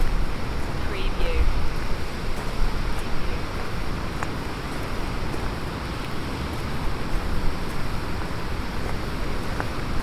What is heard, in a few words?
Rain on a hood walking, footsteps, pebbles, near the sea loop; beanstalk audio